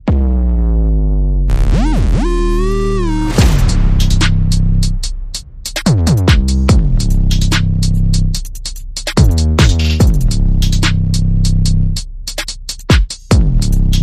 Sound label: No Keys